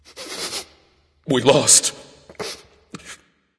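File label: nonverbal boohoo; smite; voicepack; ullr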